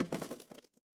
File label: impact crash